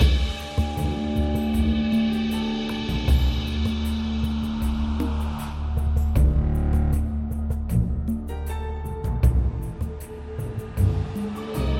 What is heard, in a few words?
music main; Fantasma; hades River Of Souls